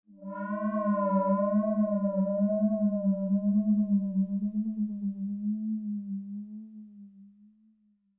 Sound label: shredding synthhit; zps; Ambient